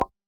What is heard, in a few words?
pop fillblank